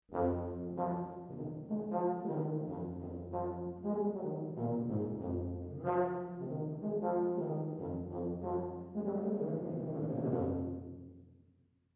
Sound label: Tuba EMT250 SOLO